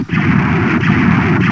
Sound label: TURBO LSR; STARS FXS; East West; Global Sounds